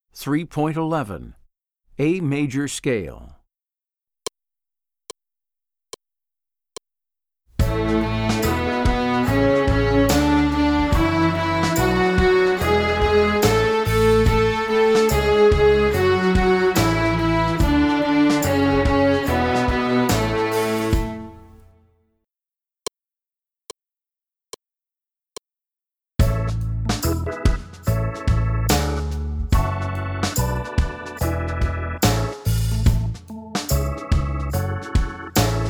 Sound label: A Major Scale